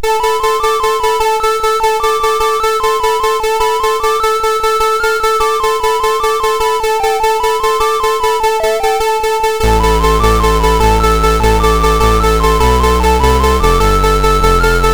Irish Ballad, MOS 6581

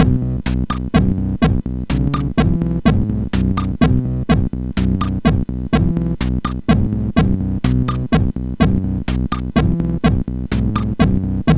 Frog Dance, sid MOS6581R4

